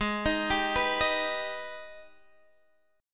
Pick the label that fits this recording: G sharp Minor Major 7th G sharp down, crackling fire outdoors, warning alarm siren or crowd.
G sharp Minor Major 7th G sharp down